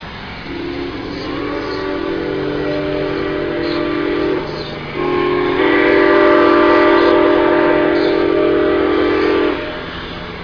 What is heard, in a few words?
locomotive